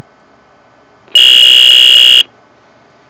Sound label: rejection buzz